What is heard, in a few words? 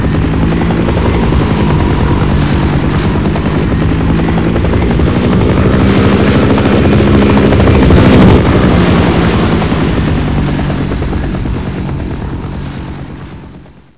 osprey takeoff